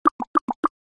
multimedia game designed pop wet seq

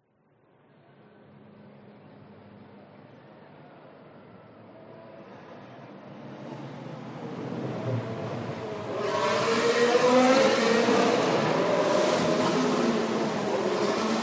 f1 race track pass speed

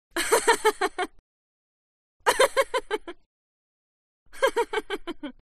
HUMAN VOCAL Female Laugh; smartsound

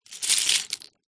foley shells small pick up grab handfull

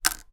household clothes peg drop on table

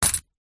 money pouch fabric coin single drop in; foley

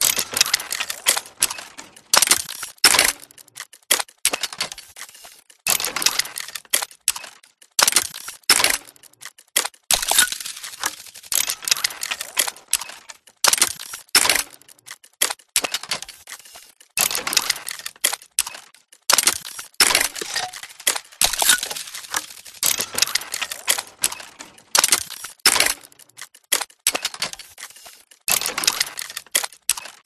Steampunk Mechanical Loop; PMSFX

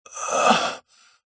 human male single breath groan pain ill

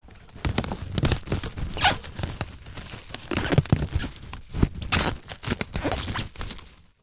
household cling film wrap loose handle close up

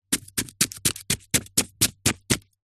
horror kitchen knife stabs body multiple fast; zapsplat

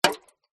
foley drink can cola impact liquid inside

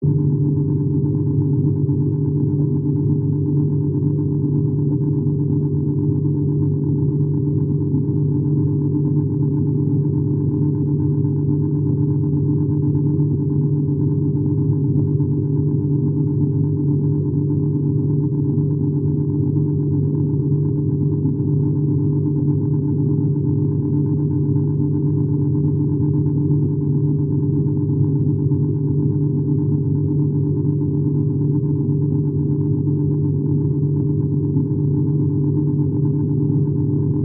Sci Fi Atmosphere; gain walkers

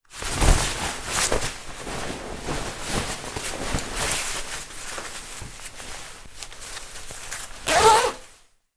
foley raincoat put on zip up

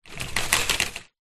transportation bicycle wire basket shake movement

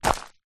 foley footstep single stoney path; zapsplat

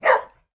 animal dog english springer spaniel single bark

